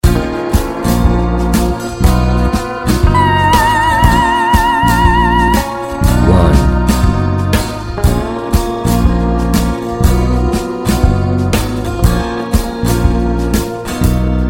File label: Bb Phrygian; pure country; ear training